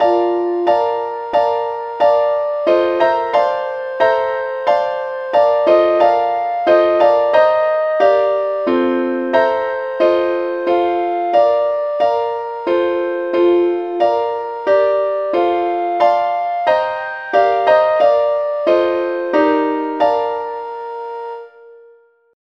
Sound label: advanced lead key bes; SAINTTHOMAS